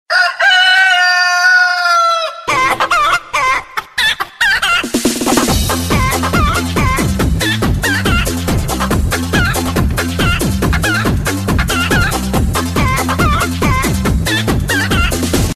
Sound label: Chicken Crazy